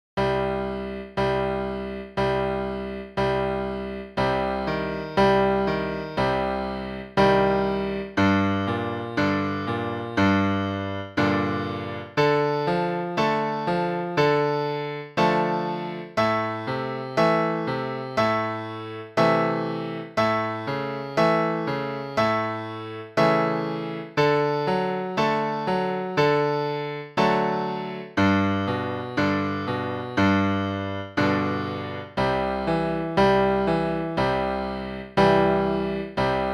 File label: osc harmony